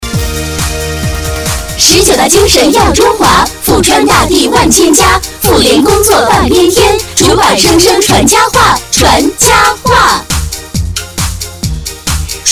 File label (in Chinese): 女 快板 妇联 多人